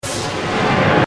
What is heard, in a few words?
Transformation, Transporter, Science Fiction; 5.1